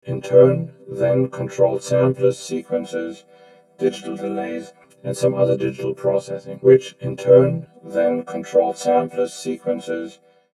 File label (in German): Vokator Sequenzer